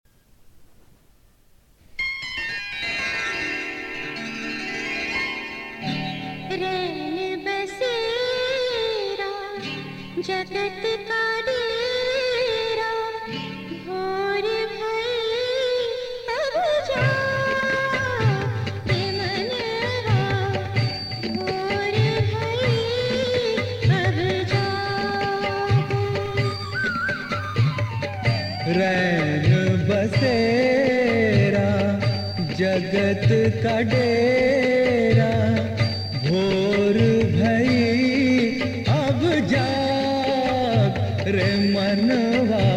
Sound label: Rain